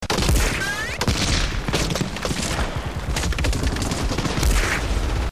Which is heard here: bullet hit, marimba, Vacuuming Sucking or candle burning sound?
bullet hit